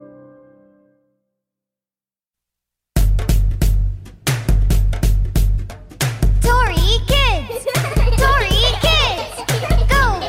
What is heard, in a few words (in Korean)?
영어 여 동요